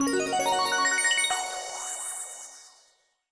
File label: medium Win Count Up; fireflies